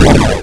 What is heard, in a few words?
explosion; orni-attack